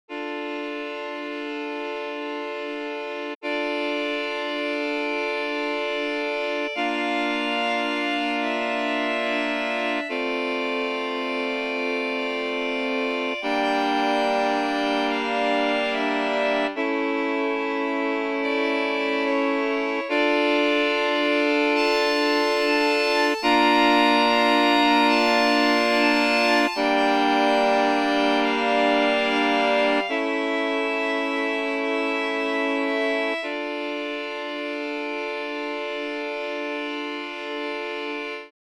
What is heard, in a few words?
sample oscillator